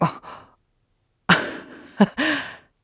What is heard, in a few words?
Flustered; Link flustered; Skyward Sword